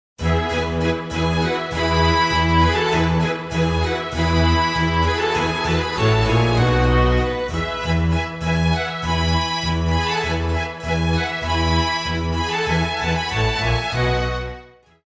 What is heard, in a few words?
classical